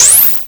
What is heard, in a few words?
hivemind knockback; Station-0